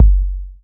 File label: Bassdrums